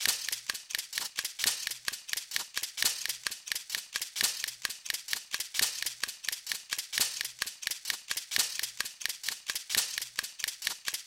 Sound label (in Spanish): Torbellino loop